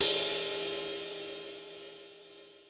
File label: RIDE Brush TD, Roland TD 7; DRUM MACHINES